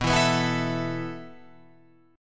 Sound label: B 7th Suspended 4th B down Guitar Open F; B7sus4; strummed; JGuitar